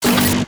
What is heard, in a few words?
medi shield burn, weapons; tf2